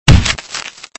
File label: book impact